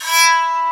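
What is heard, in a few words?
bowed psaltery; Proteus3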